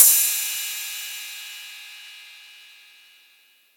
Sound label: drum crash f key; Cymbal; drum sample; single hit; one shot